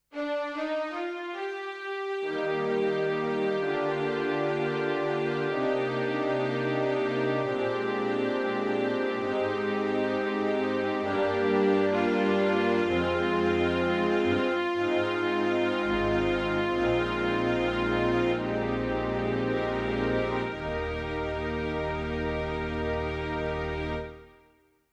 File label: Strings